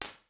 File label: Bass Space, Tiny Rooms; Convolution; NI Komplete; Kontakt 5